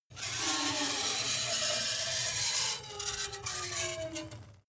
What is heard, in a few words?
metal screech phaser steady long